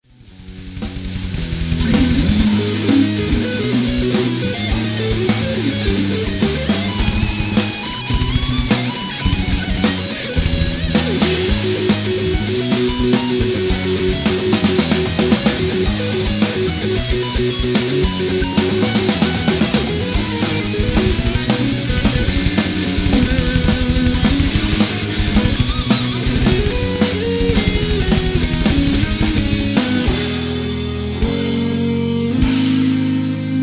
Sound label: guitar solo, metal